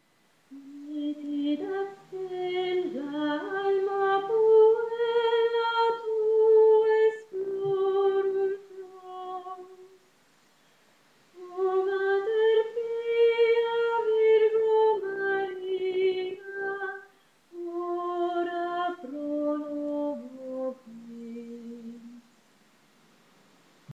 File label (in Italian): Contralti; Nitida Stella